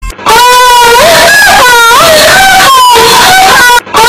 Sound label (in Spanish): broma gemidos epica